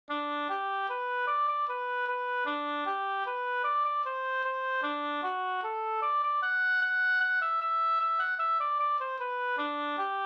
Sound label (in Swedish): mazurka; Gustavs morsa Flen